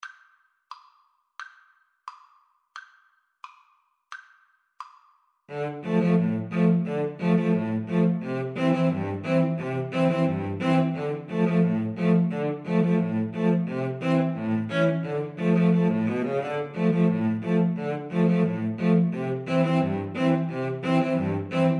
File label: violin cello, Violin-Cello Duet; the auld grey cat